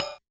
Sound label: Kit Hat, Realistic Concertmate-670; One Shot Kit